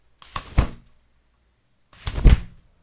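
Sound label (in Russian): звук раскрывающегося зонта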